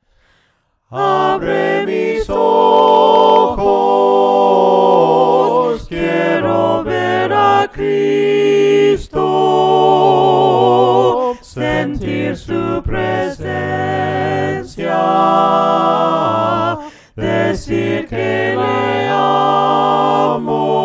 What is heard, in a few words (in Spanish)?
VOCES, himnos a capella; cantos del camino